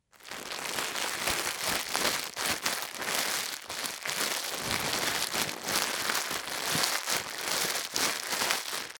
zvuk paketa

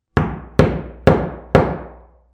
zvuk molotka

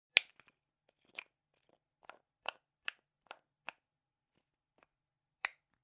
kryshka tjubika

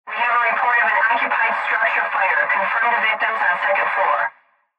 zvuki pozharnoj mashiny

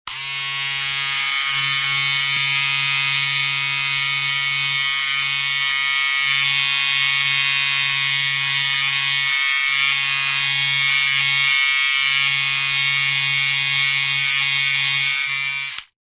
zvuk mashinki dlja strizhki

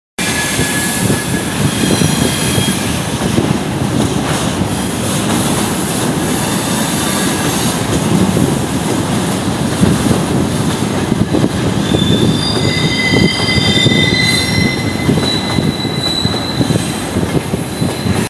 zvuk poezda

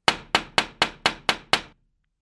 stuk v okno zvuk

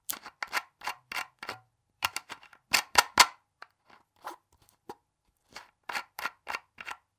zvuk kryshki

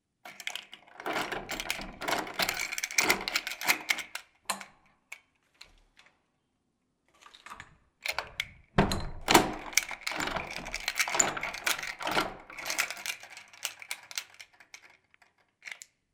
zvuk otkryvanija dveri

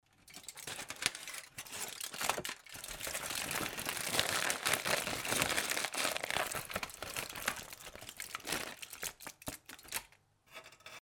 zvuk folgi

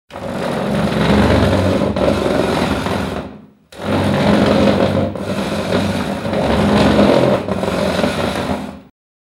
zvuk taburetki

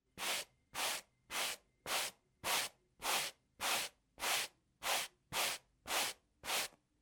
zvuk shhetki

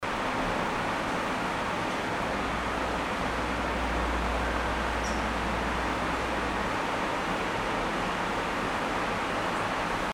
zvuk kondicionera

